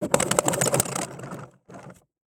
pencil sharpener

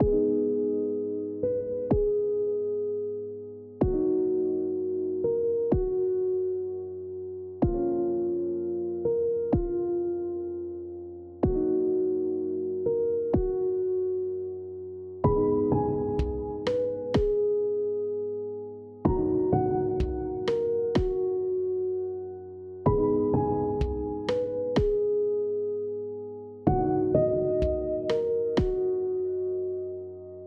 lo-fi music

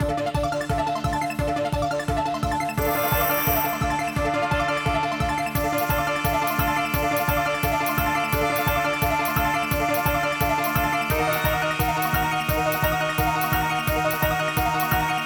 chaos battle

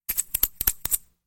shake

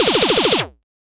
laser burst

